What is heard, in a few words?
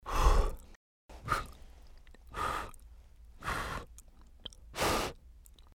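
ramen blowing; fufu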